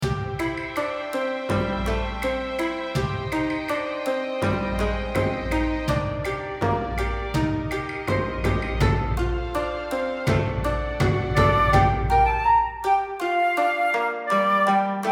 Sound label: oriental